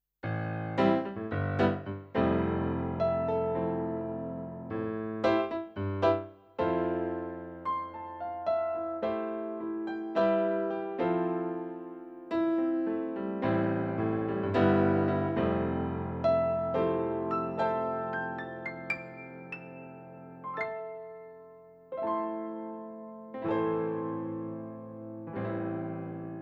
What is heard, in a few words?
SP280 Piano